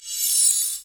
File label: Mana